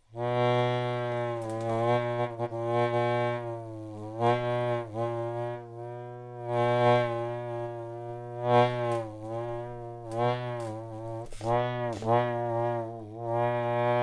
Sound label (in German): fliegen, Auf dem Akko Vögel, Hunde, Tiere, Gegenstände oder Geräte imitieren